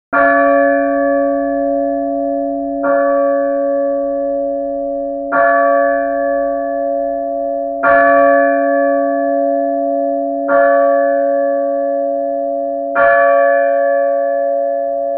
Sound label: Bells 12 OClock; Sound Design